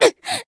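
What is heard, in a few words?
damaged, Japanese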